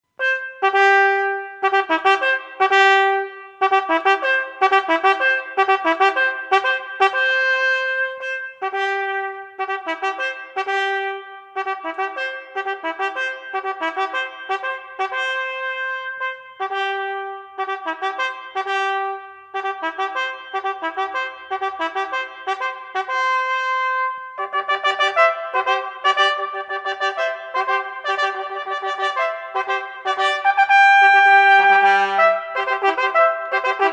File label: Fanfare; Otello - Act III